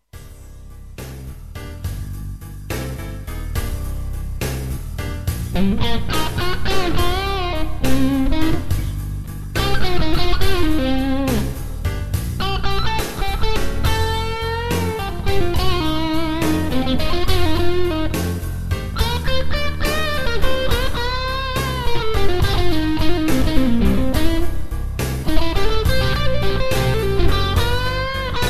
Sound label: classic blues; Tonerider